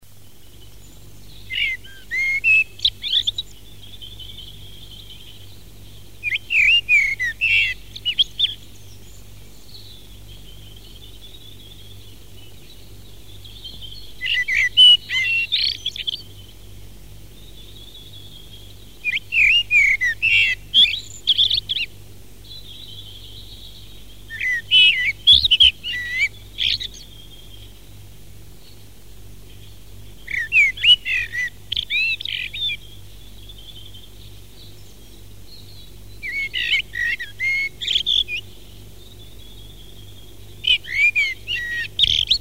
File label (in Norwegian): svarttrost; fugler